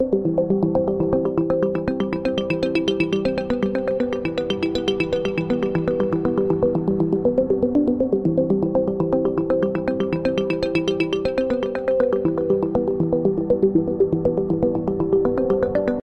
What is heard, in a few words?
Dreaming Synths